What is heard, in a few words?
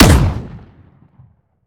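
romeo870 fire player, weapons; gmod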